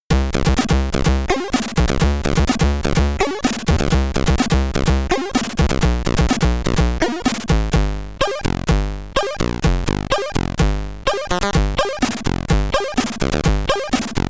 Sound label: BGM, SID Stereo; Teenage Mutant Hero Turtles: The Coin-Op; Commodore 64